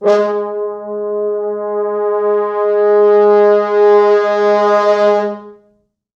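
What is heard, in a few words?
4 French Horns, Horns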